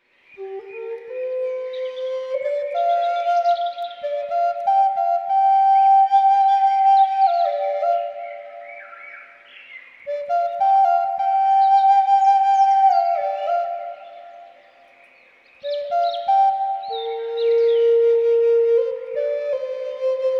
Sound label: flute sample